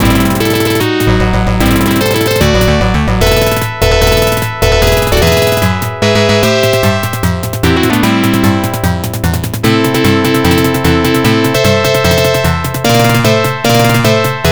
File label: arcade start demo; commando